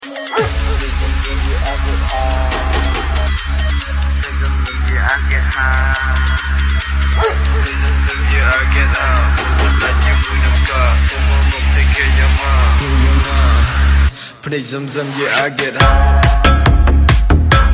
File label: Lovely Dog Remix